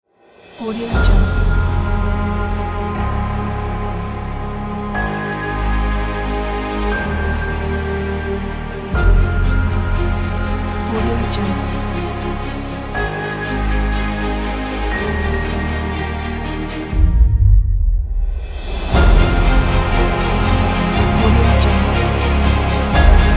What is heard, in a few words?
cinematic trailer